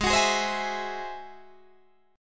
Ab Augmented 7th Ab down Guitar Open D

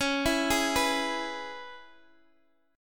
C sharp Minor 7th, Guitar Open D; x,x,11,10,11,9

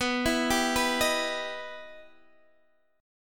E Minor Major 7th

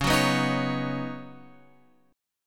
Db 9th Suspended 4th Db down Guitar Open F; Db9sus4; strummed

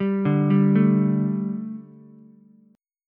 D Suspended 4th D, Ukulele; Dsus4 arpeggiated; JGuitar

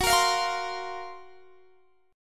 Gb Major 7th Flat 5th, down, Guitar, DADGAD

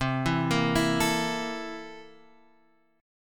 C 13th C; C13 Chord {X 3 2 3 5 5}; arpeggiated

